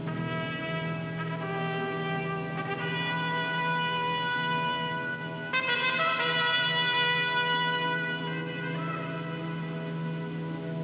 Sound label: fanfare; RedDwarf